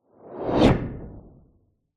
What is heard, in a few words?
sound design cinematic whoosh fast; zapsplat